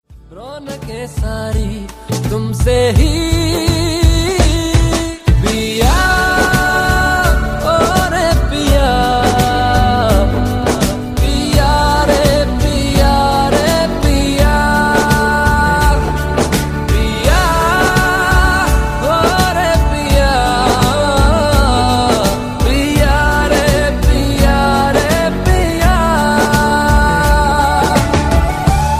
bg music; ringtones

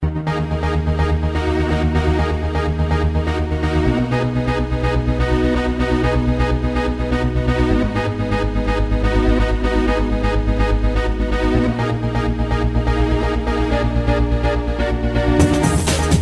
ancient chords

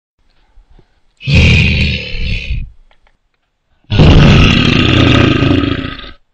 bear roar

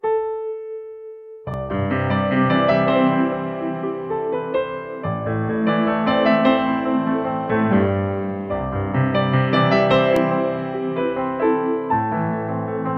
pian cover

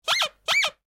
bird squeeze; animals

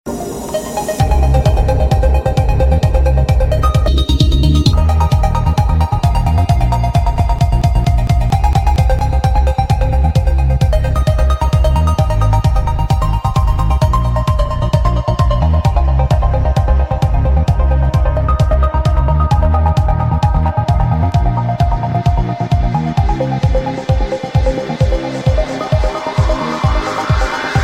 Best Sweet Ringtone

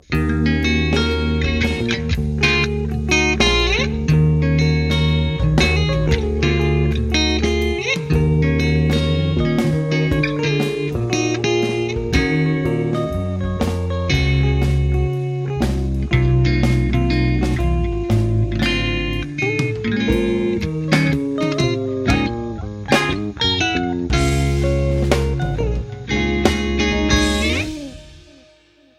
Bluesy Jazz

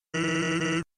Text Tone; Sans

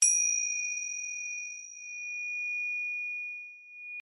Tingsha bell

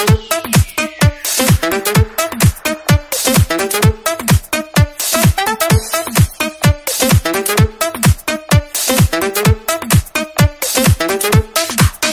Disco Night, ringtones

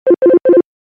low business tone; ringtones

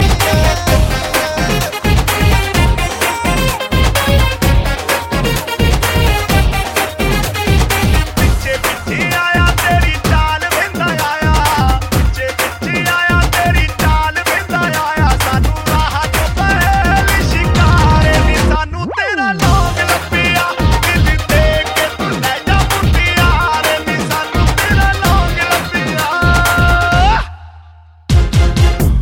Punjabi Dance Mix

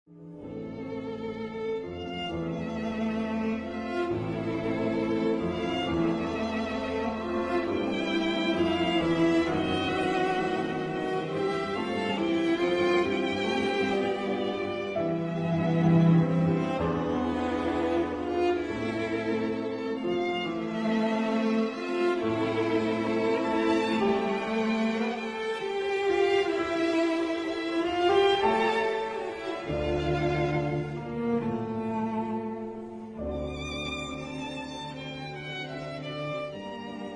piano quartet in a minor

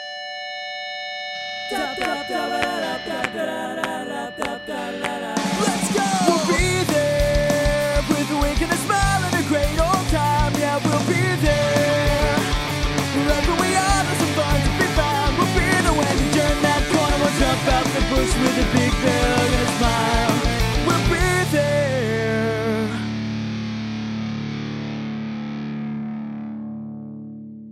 Intro Punk Rock

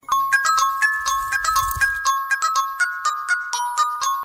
loving music

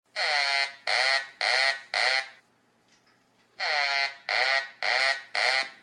duck phone

